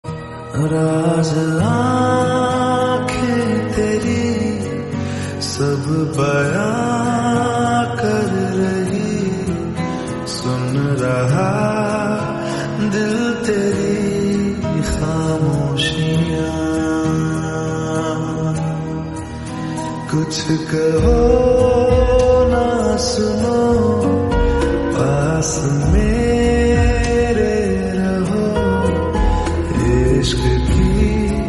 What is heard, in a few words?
Lofi